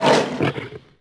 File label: Lion Die Vox; Civ4 warlords